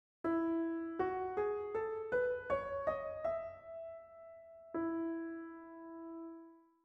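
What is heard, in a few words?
E major scale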